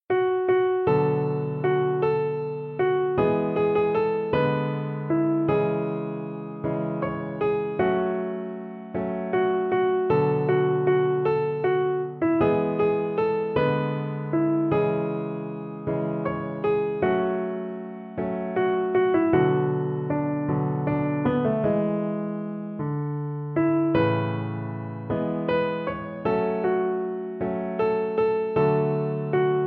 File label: easy piano